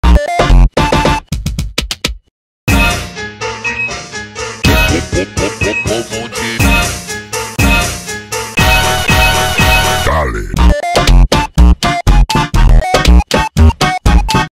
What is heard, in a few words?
beeper funk slowed brazilian funk on launchpad